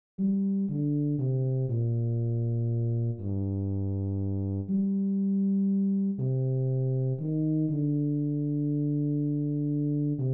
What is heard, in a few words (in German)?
bass